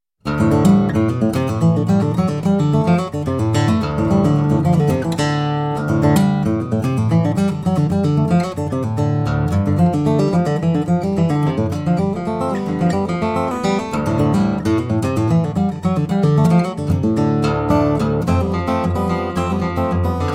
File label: Instrumental